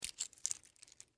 Wrist Watch Up, Foley, Wrist, Watch, Pick, Up, From, Hand; Clock; Machines; BlastWave